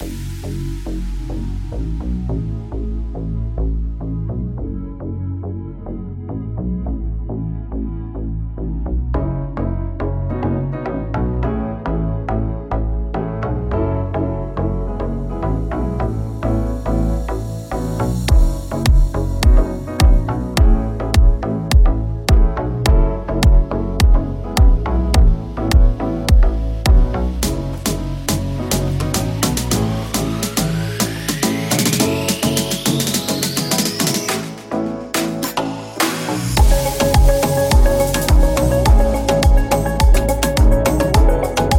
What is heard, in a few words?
Racing Bass; painting with sound